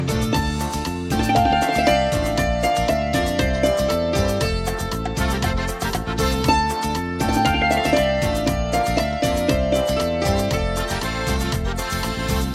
ringtone; بی کلام

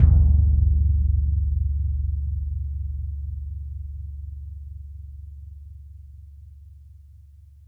Concert Bass hit

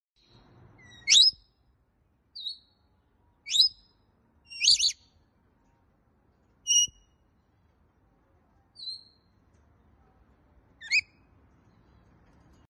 Rainbow lorikeet

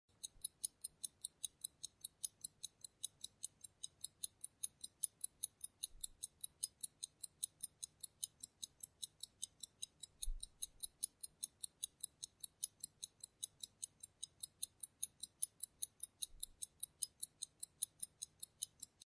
pocket watch

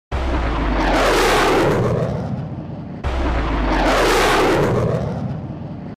FIGHTER JET

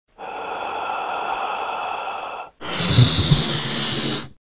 houf; هوف

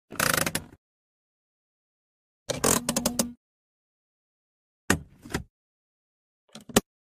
Car handbrake

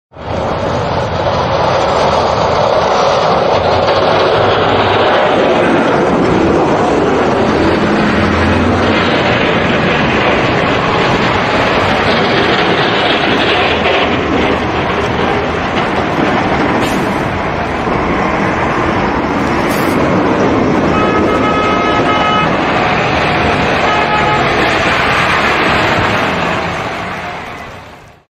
Snow plow tractor; تراکتور برف روبی

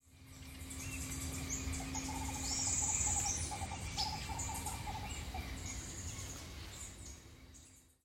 Green ibis; پرنده اکراس سبز

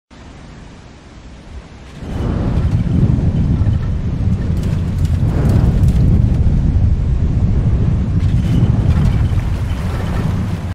Avalanche

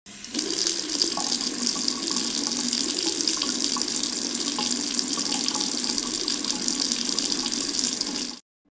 faucet; شیر آب

